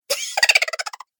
Chipmunk Laughter; voice; Laughter Sound Effects

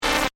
Glitch Digital; Sound Design; BlastWave

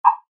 Rollover, Short; Mouseovers (Sound Effects:Multimedia)

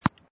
Tennis Ball Bouncing on Court Soft; Lynne Music

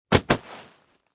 Body Fall Impact; Wrestling and Struggling; Combat

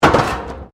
METAL BIN IMPACT CONCRETE

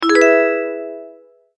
multimedia tone advance; Tones; Sound Design; McKinney Sound

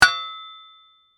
Impact Metal Shovel; Crashes; BlastWave

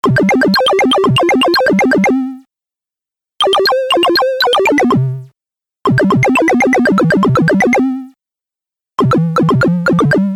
Chiptune Melodic Phrases Collection; Musical Sound Effects

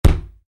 Soccer Ball: Kick Ball; Football kick; Sports; Stuart Duffield

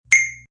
Knock; Knocks

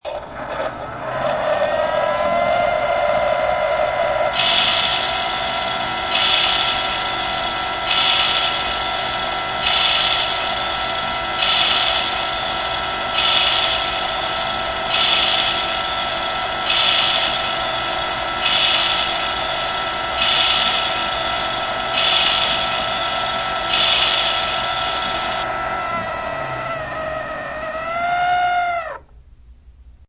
Toy grill running.; Children; Human

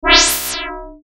Sci Fi Laser Synthesized Stab Hit Electronic; Blastwave

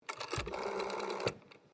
TELEPHONE WOODEN AUTOMATIC DIALING WALLPHONE DIAL; Various old telephones; Electronics

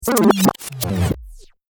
Turntable Scratching Backspin; Dj; DJ (Sound Effects:Musical)

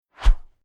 Plastic Tube Sweep By Whoosh Airy; Whoosh Sound Effects; Whooshes

